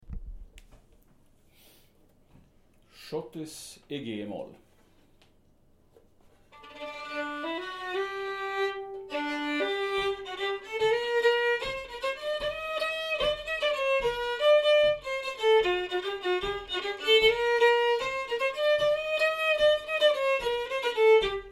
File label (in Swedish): Schottis i g-moll